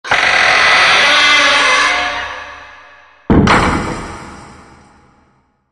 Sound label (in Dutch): deur